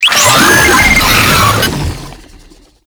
Proto Infested Brachiolyst Attack, Vocals, Enemies; Warframe